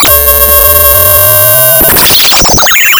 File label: pop, Sound of a cat meowing